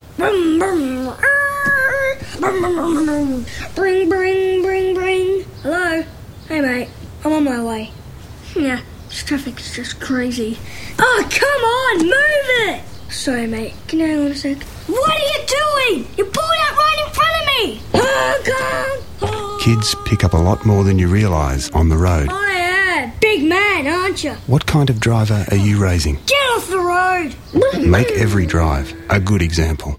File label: strings